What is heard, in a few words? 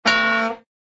drops the ball; cartoon sound fx; fred; hannah barbera cartoon sound fx